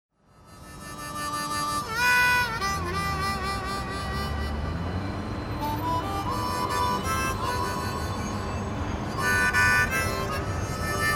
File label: Ring